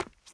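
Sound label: footsteps, right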